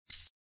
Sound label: CHIME; Book Of Ra DX